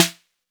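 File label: 808 Snappy with Ring, Snares; Drums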